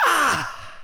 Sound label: Player Hit